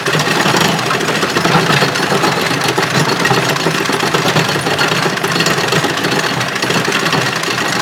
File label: Shipyards Conveyor Shells Loop; Warframe; Grineer Shipyards